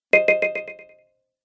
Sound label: App Delay, ping; multimedia